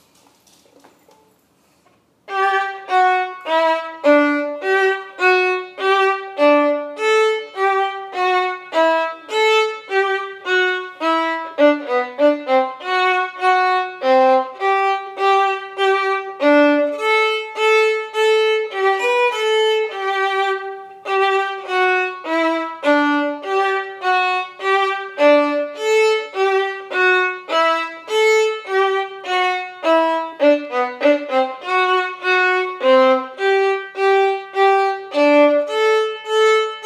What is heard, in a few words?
The Parade Violin